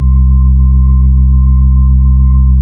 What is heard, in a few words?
B-3 Chorus